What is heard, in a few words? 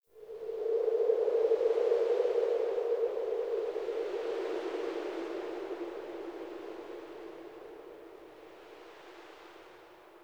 dark wind; Warframe; Tau